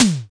high floor tom drum single hit b key

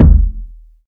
low tone kick drum sample d sharp key; Duration 0:01; Samplerate 44100 Hz

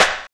short snare sample g sharp key

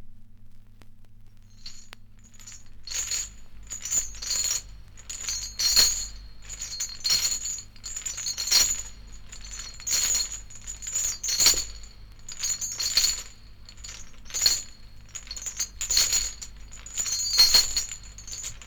scary footsteps with chains - prison

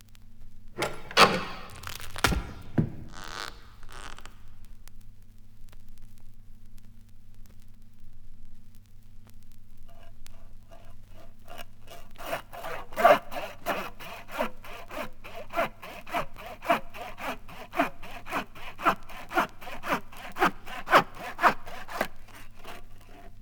head chopped off - sawing

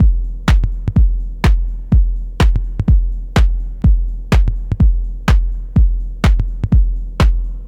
house techno beat passage fm 125; drum loops

